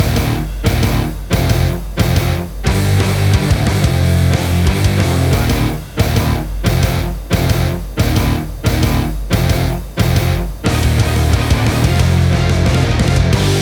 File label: Action Punk Heavy Rock Epic Music